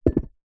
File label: household glass drinking empty knock over carpet; zapsplat